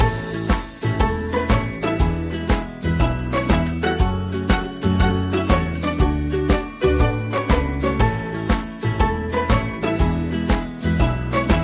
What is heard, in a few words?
Mobile Ringtone